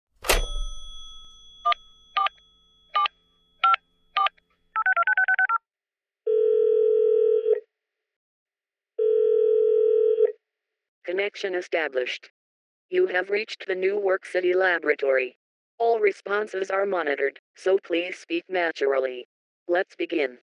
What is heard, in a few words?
phonebox